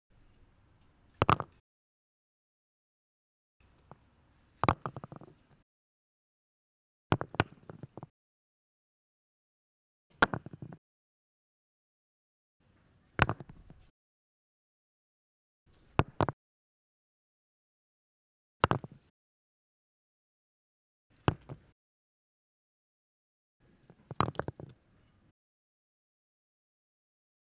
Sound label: Stones Falling On Each Other